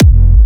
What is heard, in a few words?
Big Kick